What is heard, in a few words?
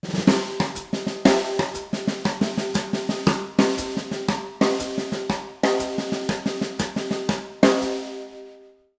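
breakbeat, 120; Jazzistic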